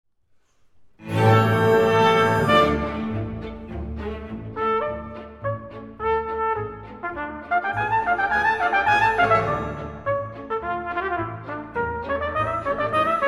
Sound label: For Trumpet and Orchestra; Kreisler Marche Miniature Viennoise; Arr. Lehn